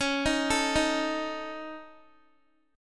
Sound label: Db Suspended 2nd Sharp 5th Db Guitar